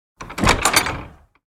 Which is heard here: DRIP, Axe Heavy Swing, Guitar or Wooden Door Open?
Wooden Door Open